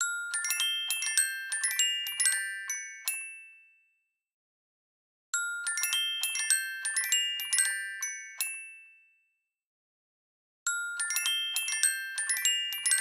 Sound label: Music Box, ringtones; LG X5 2018